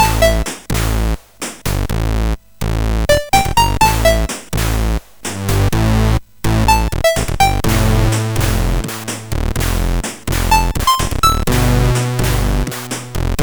Crash, MOS6581R4

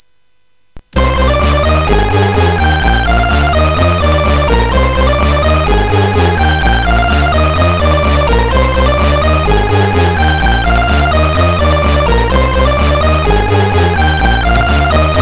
sid CSG8580; ASA; hvsc

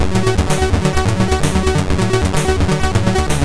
sid, MOS6581R3; David Music Demo 2

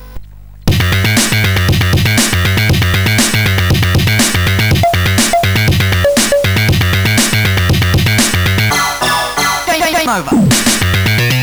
Digi Tune sid CSG8580R5

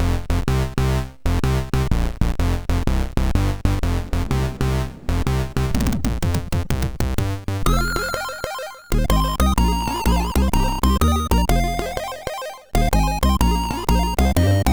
Ocarina, MOS6581R4

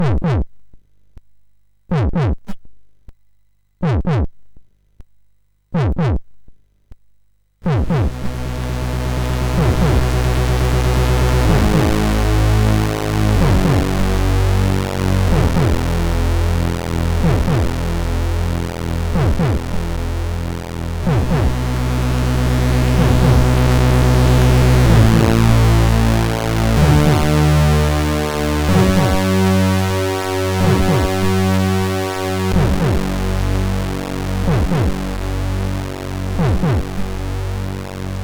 Digital Zakx tune J, sid MOS6581R2

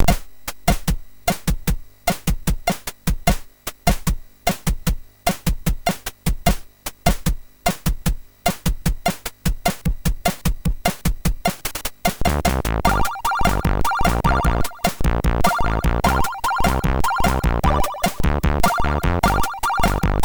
Little Bossa sid MOS6581R4; Henne; hvsc

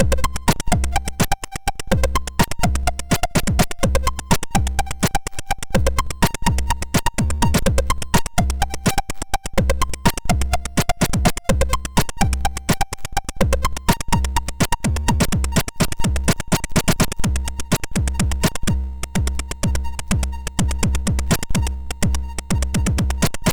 Break the Beat, MOS6581R2

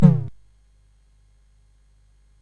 Dark Castle, CSG8580R5

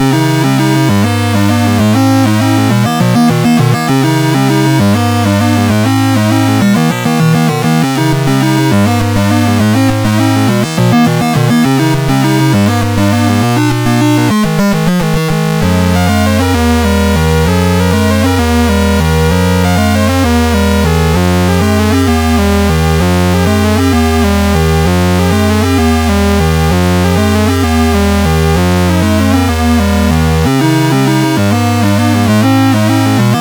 BASIC, CSG8580R5; Commodore

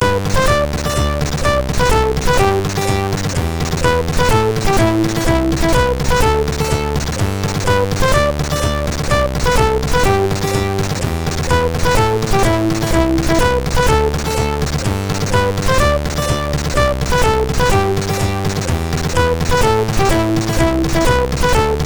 sid MOS6581

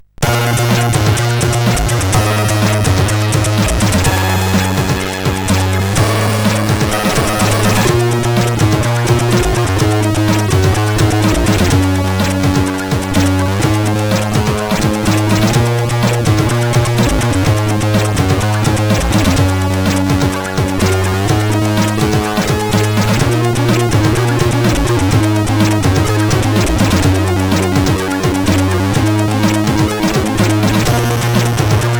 tune, sid 8580R5

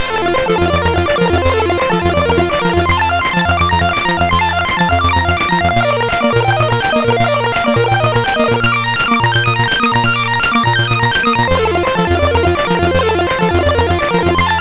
Quartet, CSG8580R5

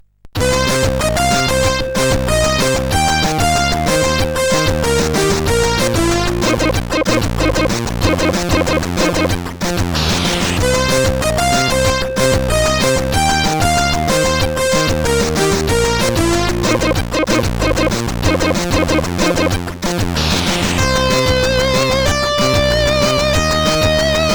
Takt, CSG8580R5

